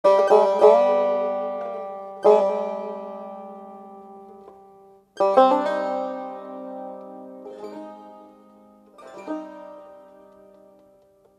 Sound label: Tar Solo; Taknavaziye Tar